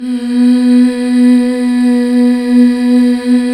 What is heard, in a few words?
MMHH, BACK. MMMHH Fem